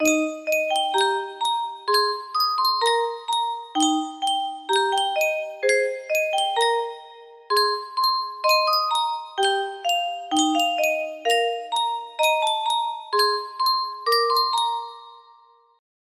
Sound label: Yunsheng music box unknown tune